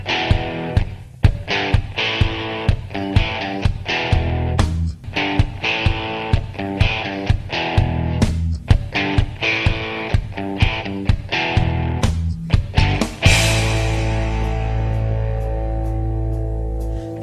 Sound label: Loop